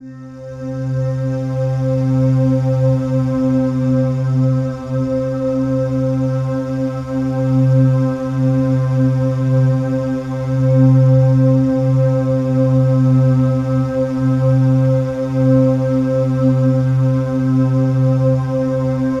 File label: Atmosphere C